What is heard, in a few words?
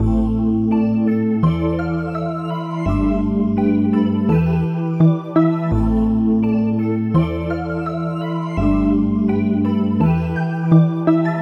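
A minor 168 BPM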